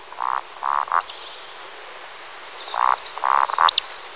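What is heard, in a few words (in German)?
waldschnepfe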